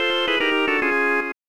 Horse Racing Lose Bet; A Ressha de Ikou 3

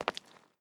concrete wet footstep

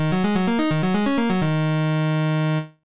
Original Level Complete; Crystal Mines; NDS gamerip

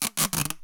Tape; Cherricar

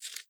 text scroll

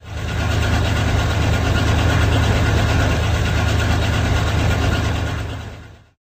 Tank Engine

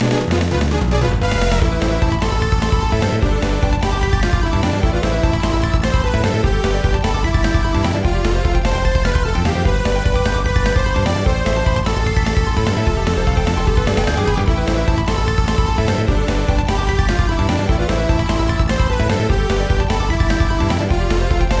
Suspense; Golden Sun: The Lost Age

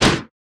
Machine Gun Shoot

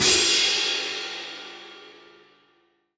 Cymbal; Five Nights at Freddy's; Ultimate Custom Night

